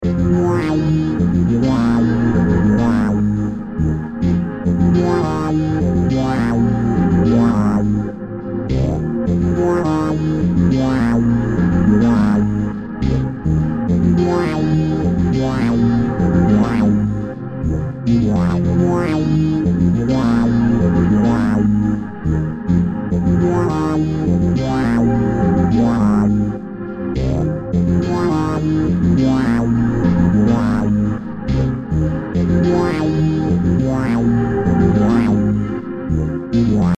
guitars and bass

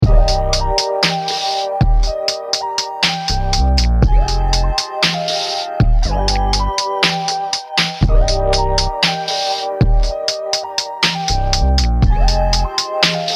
Arp melody

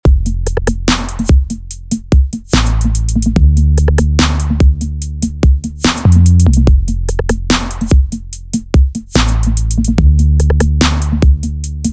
System-8 Trap Bass

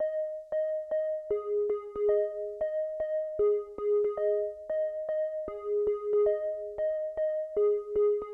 Main Melody Synth JUNO

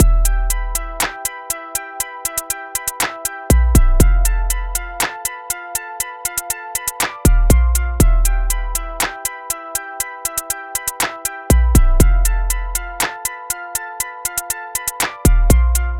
808 bass loop

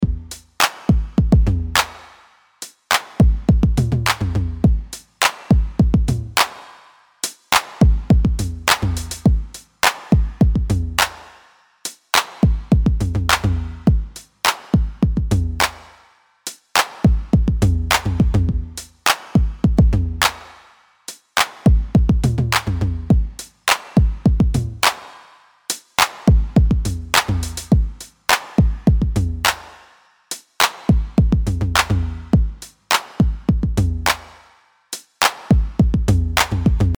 drums 104 bpm

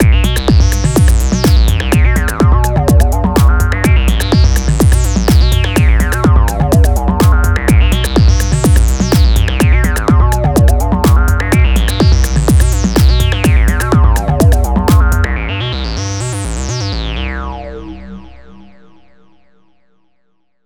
SH-101 Acid Lead

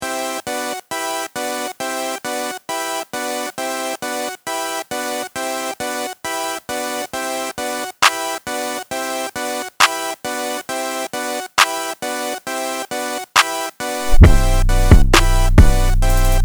full beat

